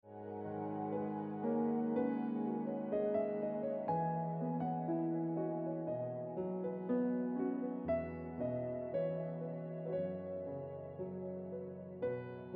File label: Piano Music